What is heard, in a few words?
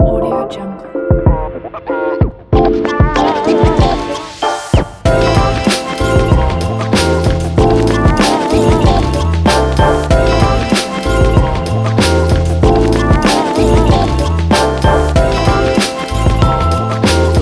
urban stylish hip hop